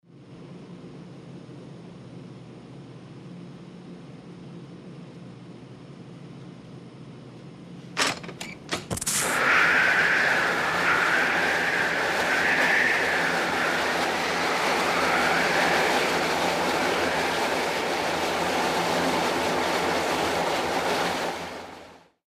Jet Door Opn Flight, interior perspective

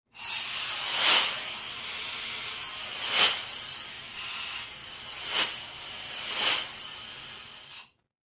Air By Fast Close

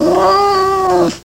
Cat Growl Meow

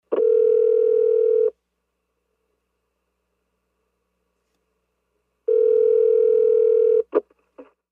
Cell Phone Inline Ring, rings; futz

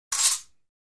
Fencing; Swords Hit And Slides

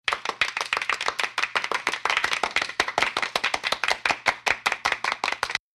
10 Women Brisk Clap

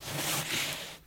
Slides, books; Crypt Keeper

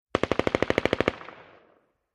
M16 Short Barrel Mach

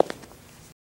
FS Male On Hardwood, singles wood

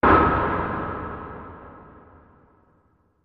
Bass Impact Tonal So; impacts

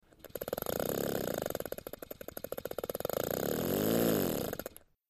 Motor Small Compressor, air compressors

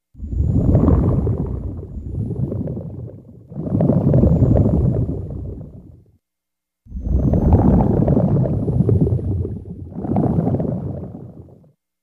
Bubbles Underwater

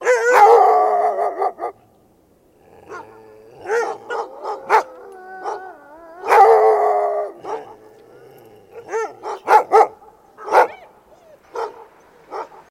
Dogs Barking Sparse

